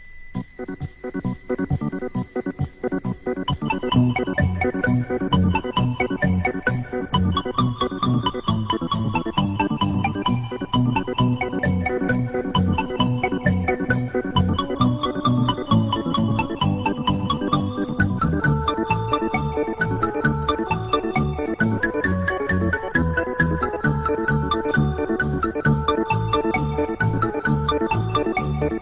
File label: contemporain